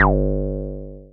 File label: Synths BASS